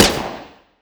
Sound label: Shooting; DungeonShooting; Godot; 金币音效, weapon0013音效